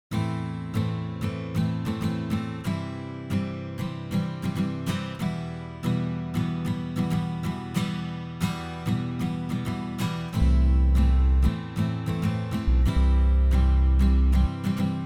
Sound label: Melody